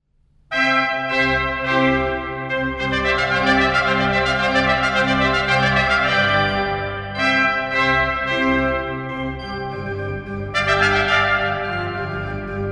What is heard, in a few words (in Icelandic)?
trompeteria